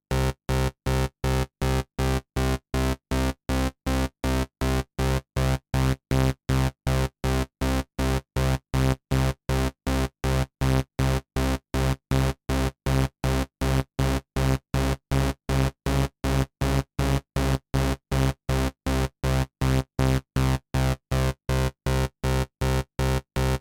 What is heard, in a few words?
Virus Detune